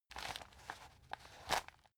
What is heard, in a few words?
plastic bag handle short